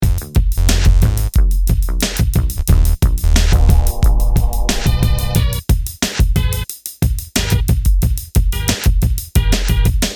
Subway Hiphop; gamerip; PSN; PS3

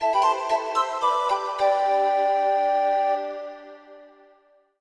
Game Start; Witch's Cat; 3DS

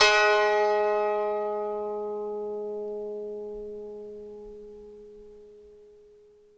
koto; Summer Catchers

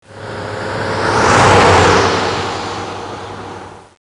JEEP

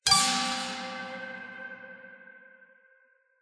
Mystery Pluck; unrest indigo

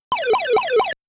Game Start Sound

